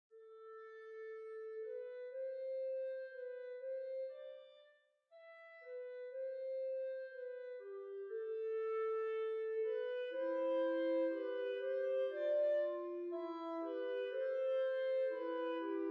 Clarinet Trio; Poulenc; Ave Verum

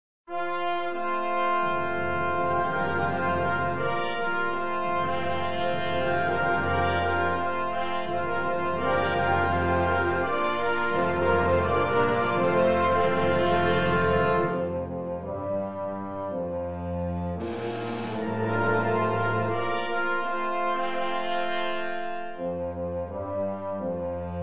Fanfare; Fanfare Of Celebration

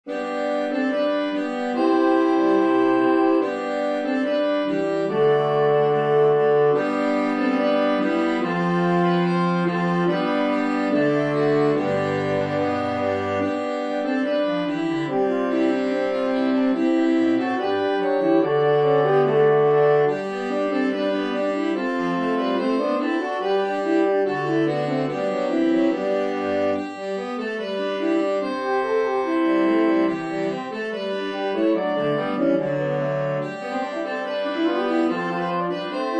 Saxophone; Byrd; John Come Kiss Me Now

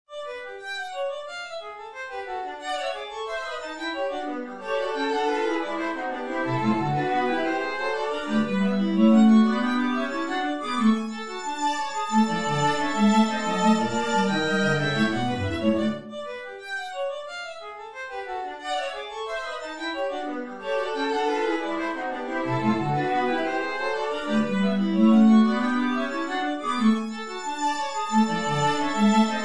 Gigue G Major String Quartet; Mozart

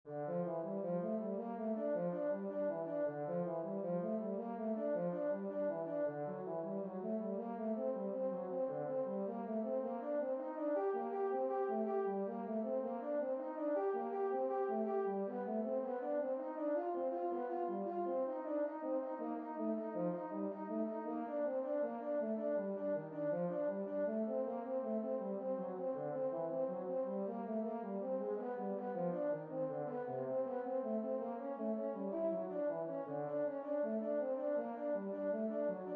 Pedal Exercitium Euphonium; lowbrass

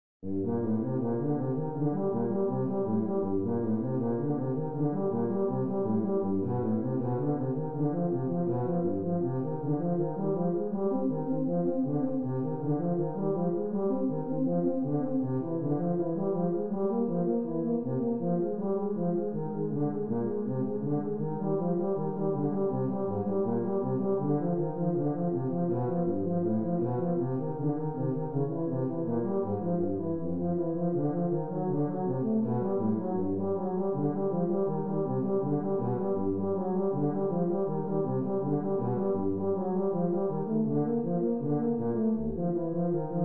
Pedal Exercitium Tuba Solo; Bach

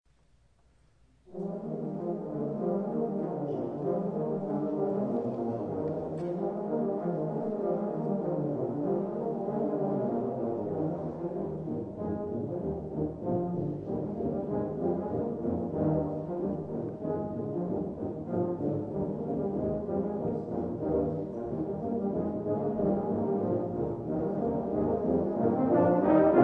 Low Brass; Grainger; Shepherd Hey

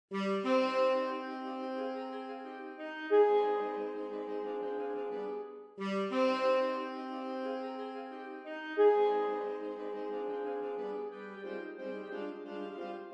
Saxophone; Mendelssohn; Mid Summer; Elf March

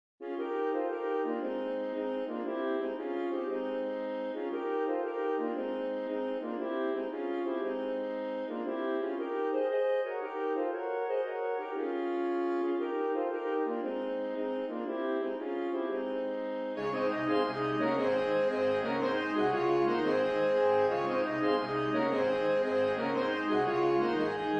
Saxophone; Grainger; Lincolnshire Posy; saxquartet

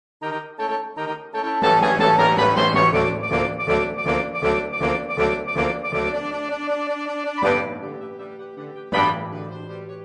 Saxophone; Ponchielli; Dance 4 Of Hours; saxquartet

